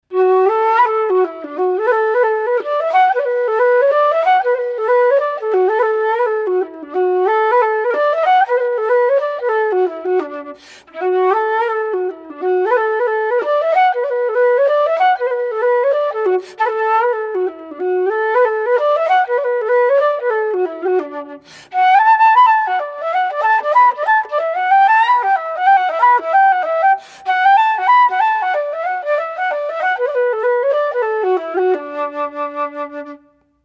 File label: Maid Behind the Bar solo flute; Christen Stephens